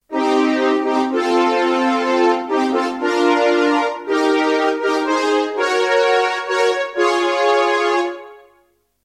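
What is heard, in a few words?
Moog Strings; Moog Strgs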